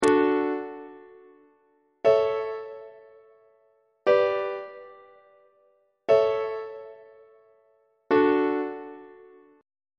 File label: d major plagal cadence on treble clef